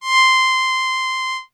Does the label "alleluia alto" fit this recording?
No